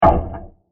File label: Heavy Wood Hit; Blasts; Impacts; Crafted Signals